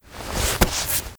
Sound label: Generic Hand Plant Foley; Warframe; Characters